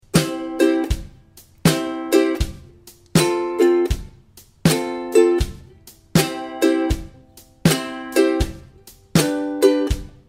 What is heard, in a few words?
strum, ukulele